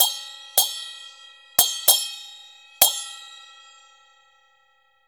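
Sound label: CHINA SM6 R, China cymbal; Spectrasonics - Heart of Asia CD2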